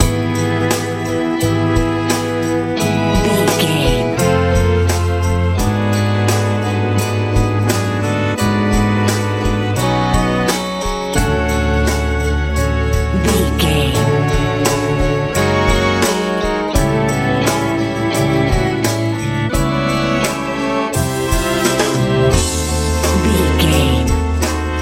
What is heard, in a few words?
hot powerful classical pop